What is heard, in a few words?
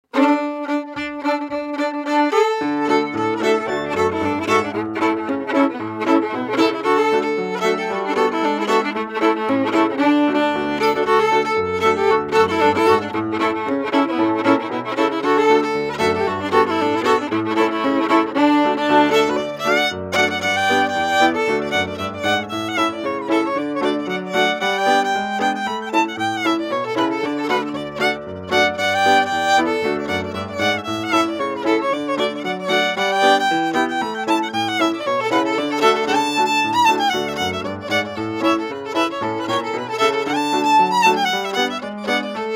Hornpipe